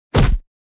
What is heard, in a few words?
fights